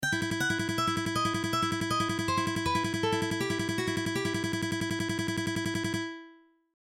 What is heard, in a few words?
Simple Picking exersice; Barr Gilly